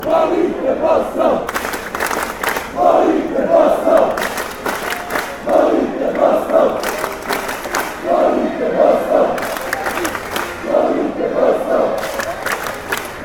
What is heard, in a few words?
bosnia chant